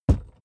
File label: suitcase hit case rattle